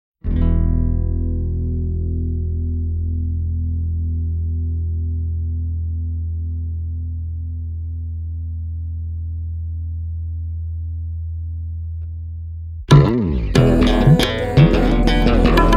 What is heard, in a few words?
Instrumental